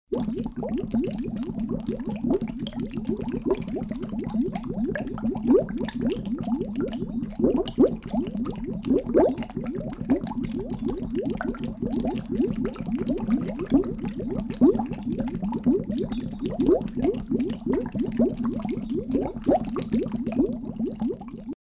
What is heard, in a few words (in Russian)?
bulkane